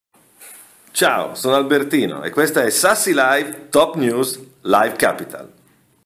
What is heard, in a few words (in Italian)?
jingle; SassiLive 10 anni; Albertino; Radio Deejay